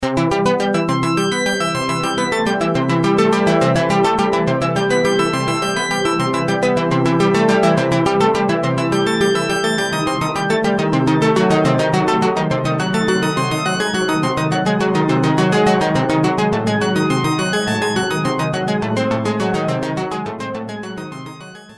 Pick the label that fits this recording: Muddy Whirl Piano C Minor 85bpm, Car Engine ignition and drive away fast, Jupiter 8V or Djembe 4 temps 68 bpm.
Jupiter 8V